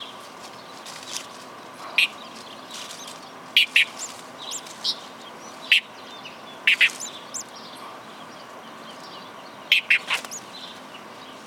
calandria voz; aves

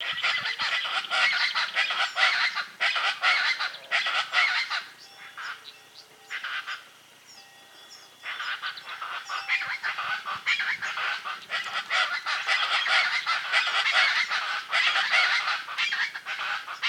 charata voz; aves

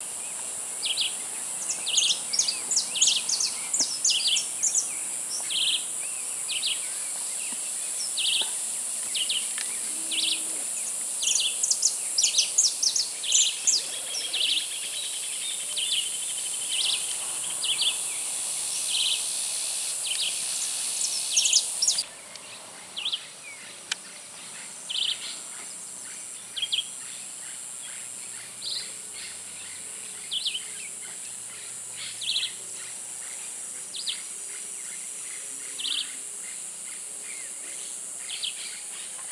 Chiví Chivi Voz; aves

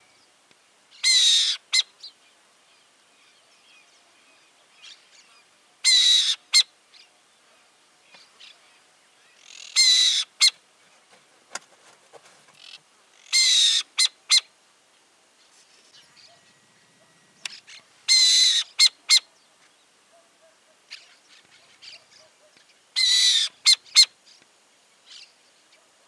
Lechucita Vizcachera Voz, aves

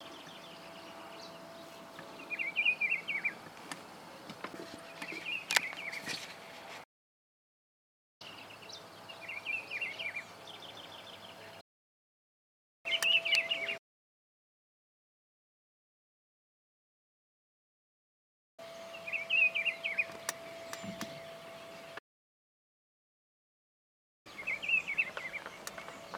Juan Chiviro Voz; aves

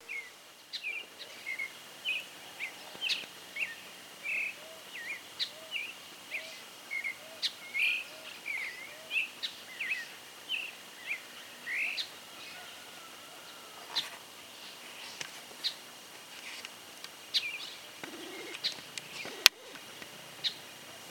zorzal chalchalero voz, aves

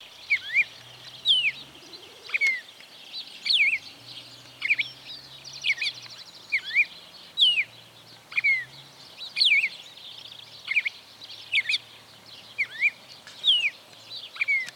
cardenal copete rojo voz; aves

